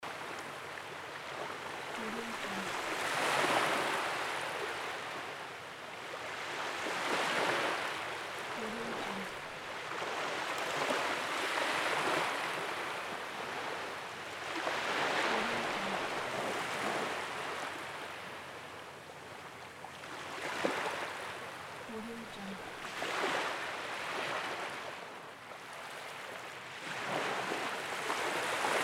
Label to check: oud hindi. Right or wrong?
Wrong